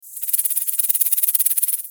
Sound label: snake selection